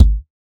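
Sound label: Bass drum thud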